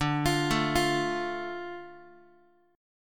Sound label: D Minor D Guitar; Dm arpeggiated; x,10,8,7,9,x; JGuitar